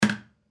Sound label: kitchen noise